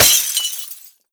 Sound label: break glass vase; physics; breaking